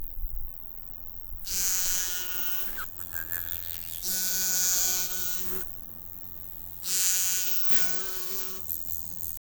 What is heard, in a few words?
the sound of a mosquito; insects